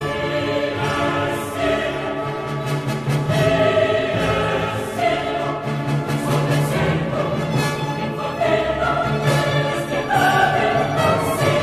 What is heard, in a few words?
level Boss; Dungeon SS2022